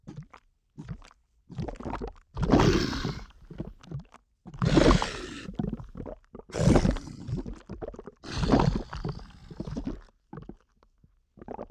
seedfrog idle, monster

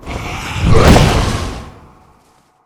reaperboss, monster

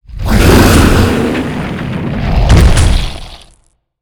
boss golem deth

